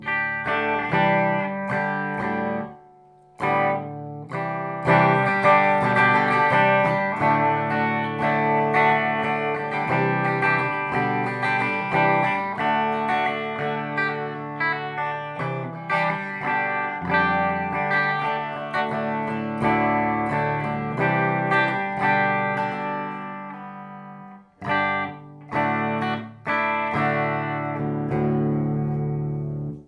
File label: strat with amp shallow; showmaster sss